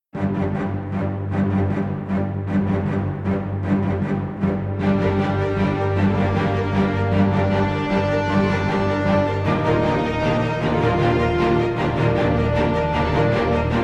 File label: epic Cinematic